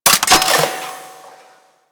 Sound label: Flak Cannon Mech; Grineer